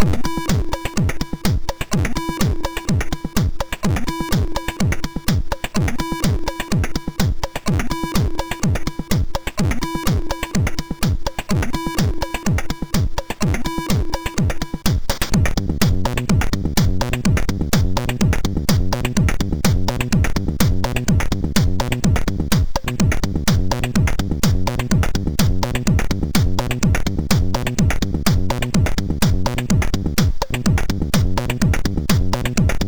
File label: Rotator tune, sid, MOS6581R2